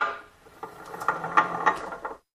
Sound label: Gears Meshing Cycli